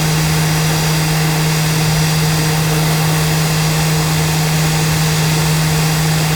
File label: Aircrafts Engines Su27 Around Engine; DCS